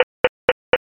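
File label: footsteps slipper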